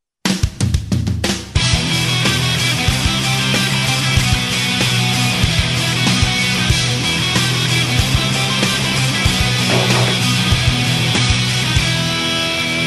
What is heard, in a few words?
Rock